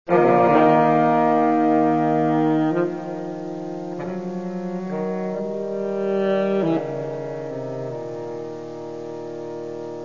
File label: bravo sax modern